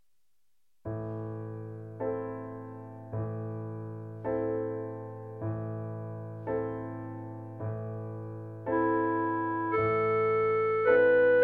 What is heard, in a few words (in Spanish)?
CLARINETE; Solveig Grieg